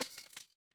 impact crash

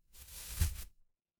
paper fabric fabric

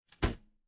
impact slam

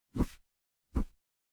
transition swoosh

